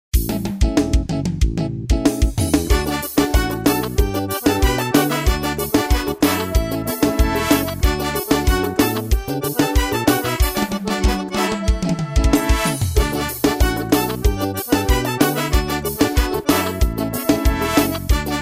teste sampler ritmo forró

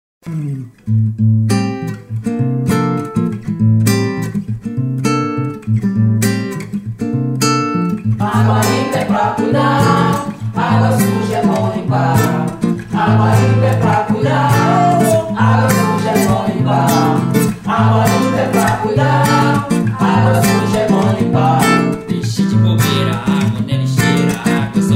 rap da preservacao

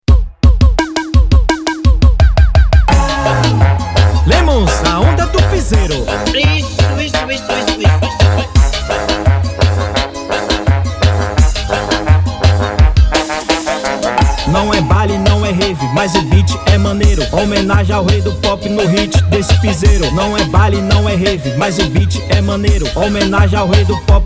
beat piseiro

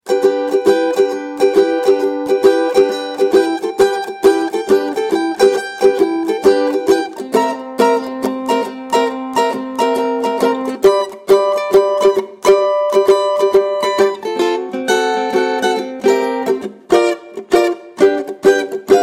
blues cavaco

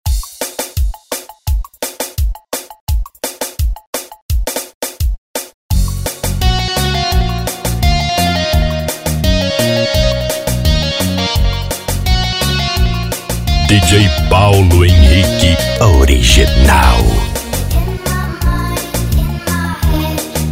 tecno melody

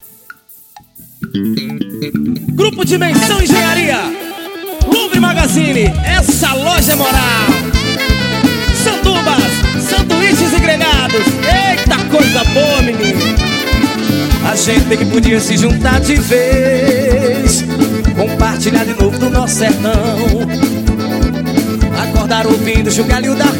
forró

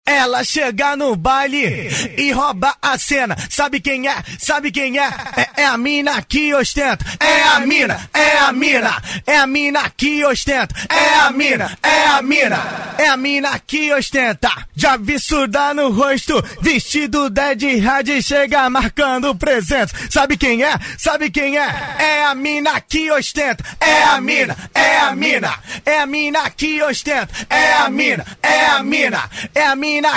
acapella funk

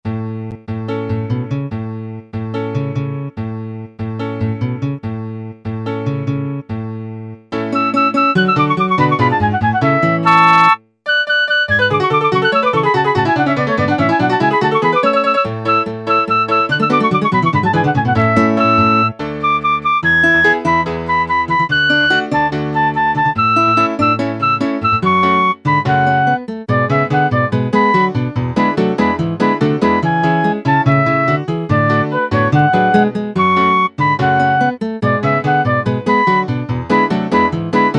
flauta doce apresentacao

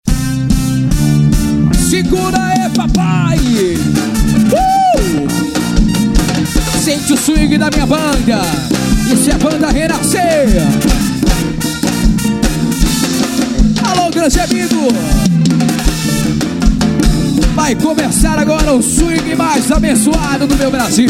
forro abertura

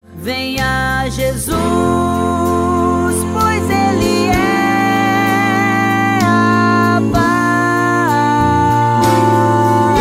quarteto vocal

